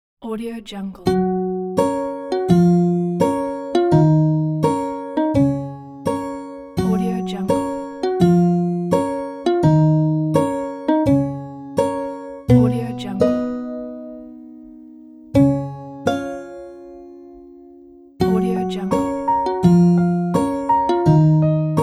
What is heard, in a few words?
curious innocence; promosapien